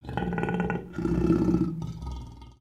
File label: Pets Lion Idle Med; creatures; Lego